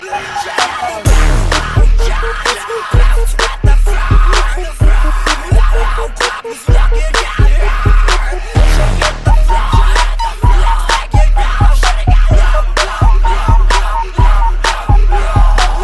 Low Screamo

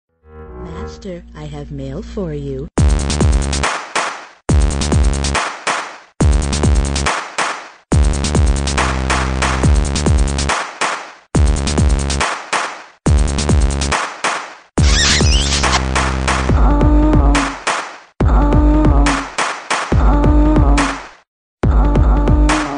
Dirty Bass

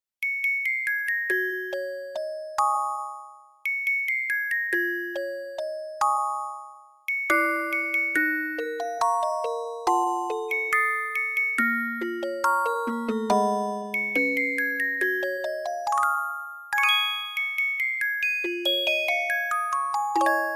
rain stops good bye

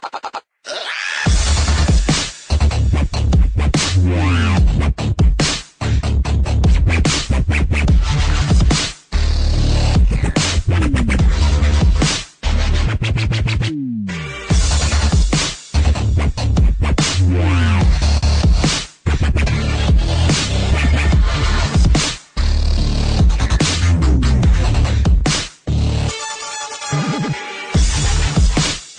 Cool Bass Melody

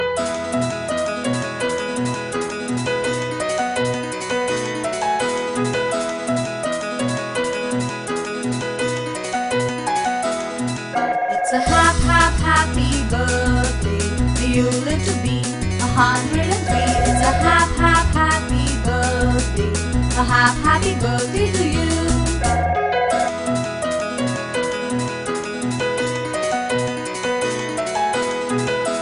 Ringtone For Iphone

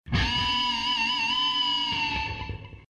Riff

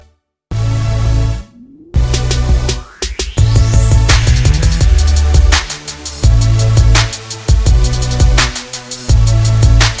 Drop Beat

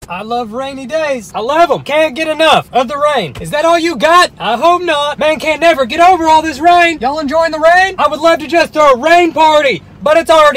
Rain

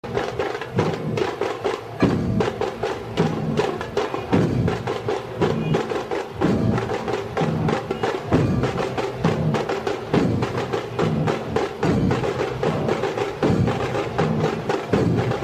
Dhol Tasha

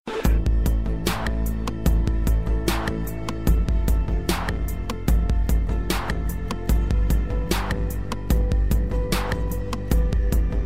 Music In Background

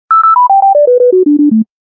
Digital Scale

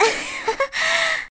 Laughing; WW Zelda

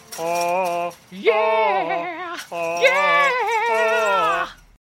Singing Zombies

